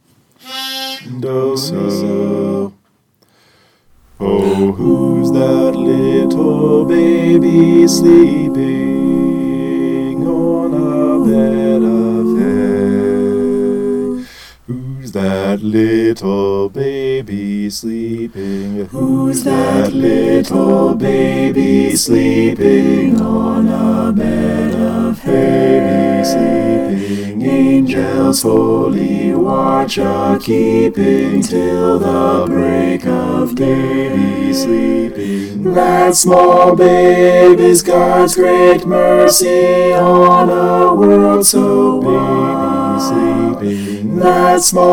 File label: alto